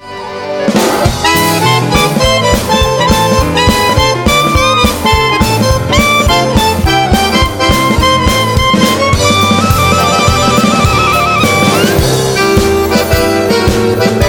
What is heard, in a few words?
ringin bells rag